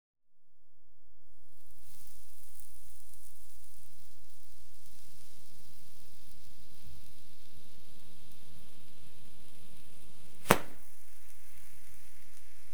Fireworks, Roman Candle, Fizz; BBC Sound Effects Library; Explosions Guns Alarms

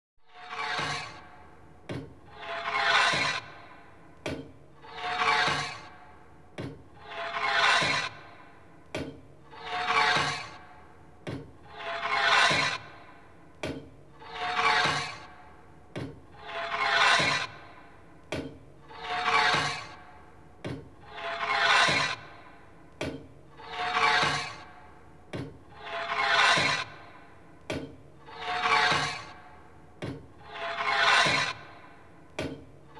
Horror, Pendulum Torture Machine; BBC Sound Effects Library

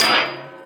IMPACT Metal Blade Clang; GameBurp; 2000 Game SFX

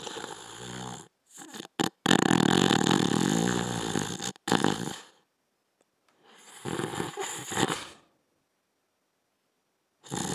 Snoring Male Snoring; Human; SFXSource

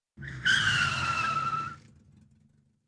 Car, Braking Skid; Emergency; BBC Sound Effects Library

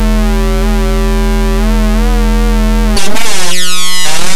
Loop, 110 bpm, Stutter Sounds; 99Sounds; Mangling Audio

